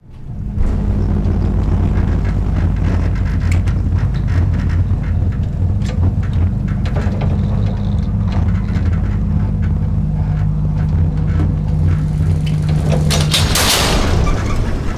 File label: elevator up; Call of Duty Modern Warfare